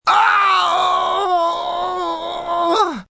Pain Long Ow, Pain, male, long; VoiceBox

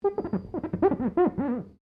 GLASS SQUEAK FINGER SEQUENCE

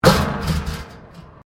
METAL BIN IMPACT WIRE FENCE; Materials

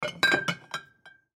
Liquor Bottle, Liquor Bottle Clank Multiple; Foley; Clanks; Sound Effects:Objects; SoundEffects-Blastwave

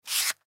Match Box Close, Fire Box Slide Close; Flames; Objects; Flame Sound Effects

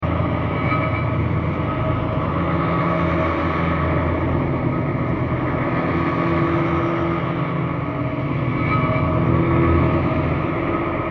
Scary Ambience 120bpm; Scary Soundscape Sound Effects; Scary Soundscapes (Sound Effects:Horror)

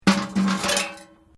Bucket Metal Upside Down; Common bucket; Materials

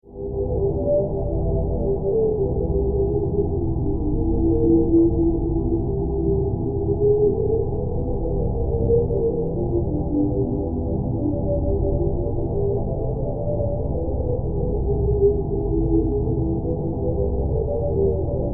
Drone Scifi Mystical Dreamy Atmosphere; Tonal Ethereal Magic

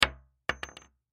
Bullet Shell; Bullet Shell 38 Special Drop Wood; Drops (Sound Effects:Objects); Blastwave; Warfare

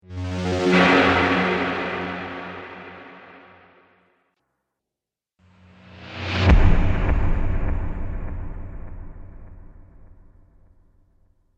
Trailer Hits Twin Pack; Miscellaneous Impact Sound Effects

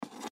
Glow Stick Pick Up; Holidays; Blastwave; Foley

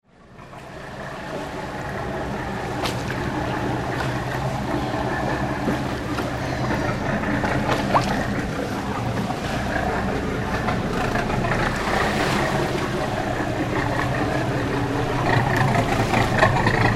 Big Boat Pass; Boats; Vehicles

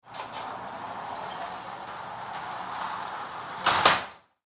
Industry Locker Gate Open; Gates; Doors

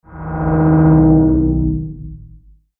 Logo Digital Swell; Sonic Logo; Blastwave; Sonic Logos; Production Element Imaging Element Accent Transition

